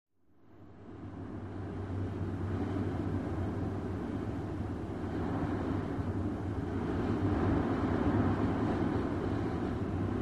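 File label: Medium Wind Heavy Gusts, trees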